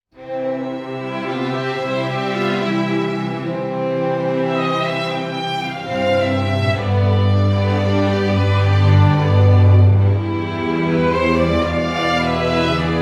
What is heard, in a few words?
Strings